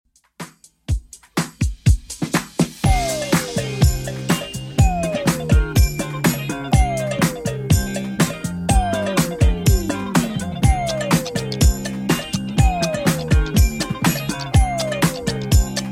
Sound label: 80s Redrum 123bpm CLEAN